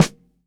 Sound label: Snr, drum